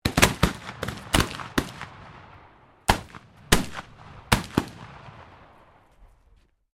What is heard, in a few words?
MUSKETS VARIOUS VOLLEY FIRE, at a shooting range; Black Powder firearms at a shooting range; Gun Shots (Sound Effects:Guns)